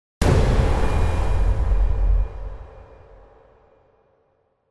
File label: CONCUSSION IMPACT